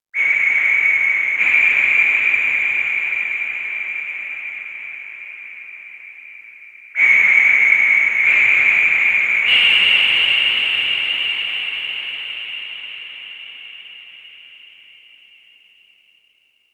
Oneshot Synth

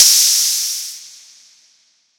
Crash

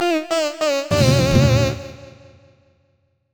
Soltian Roulette Lose; Clockwork Knight; saturn

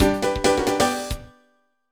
Jingle Star; Super Mario 64 DS; NDS

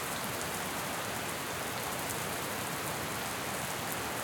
Rain Loop

